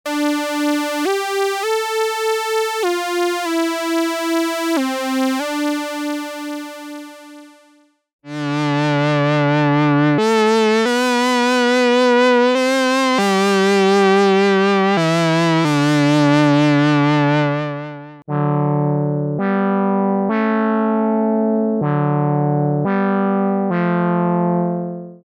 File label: Lead